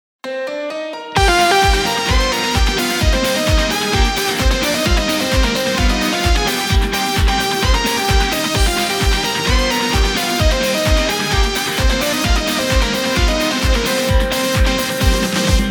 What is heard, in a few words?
no bass